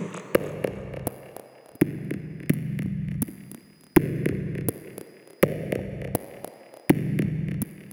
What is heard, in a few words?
clicks but idk bpm